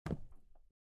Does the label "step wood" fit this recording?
Yes